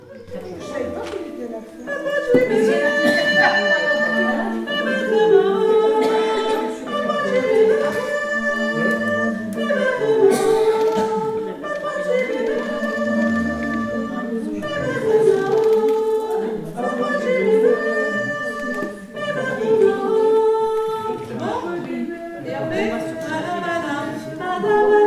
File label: Fin sopranos